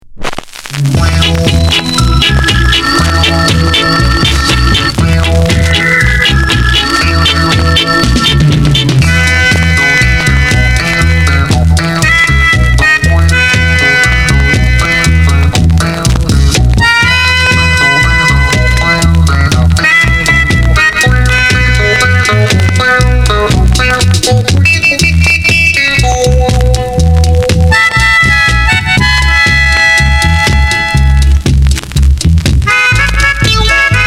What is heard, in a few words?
HAMMER INSTRUMENTAL